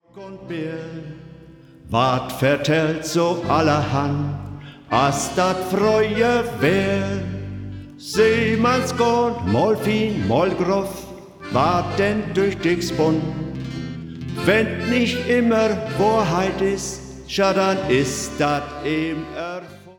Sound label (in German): Shanty Chor